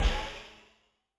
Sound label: Orch Smsh; Yamaha DTXpress-II